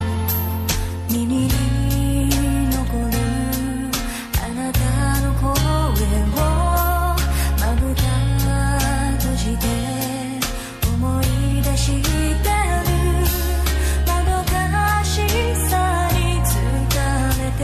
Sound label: japanese ringtone